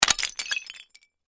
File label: Crash Pottery Clay Pot Break Drop; Crashes (Sound Effects:Objects); Blastwave